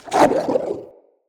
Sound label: Pack Wolf Attack Bite; Animals